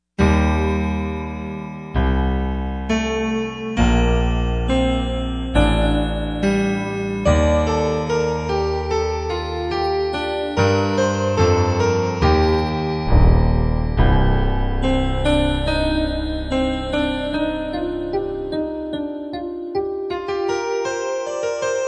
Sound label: Piano Mist, Yamaha TG55; Factory Presets